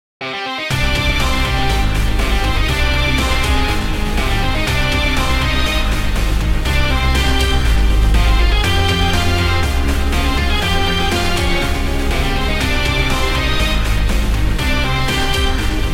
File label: GuitarMusic